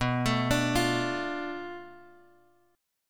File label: B-Minor 7th Flat 5th B down Guitar Open G